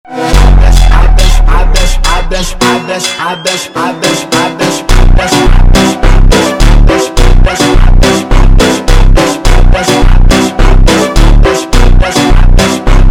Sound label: Funk super Slowed